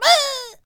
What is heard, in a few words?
Painter Death Vocals